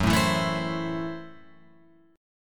F sharp Minor 7th Flat 5th F sharp Guitar Open F; strummed; JGuitar

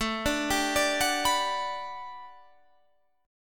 A 13th Suspended 4th A, guitar chord

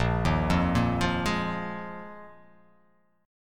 A sharp 7th Suspended 4th down

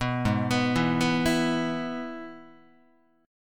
Ab Minor Sharp 5th chord, Guitar; Abm#5 arpeggiated; JGuitar

